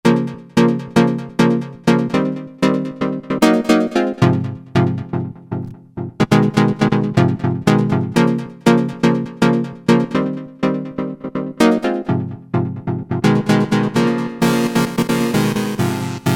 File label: Detroit Bass, Novation XioSynth 25